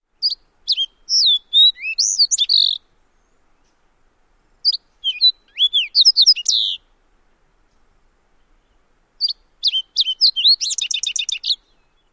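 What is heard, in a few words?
songs slate-colored subspecies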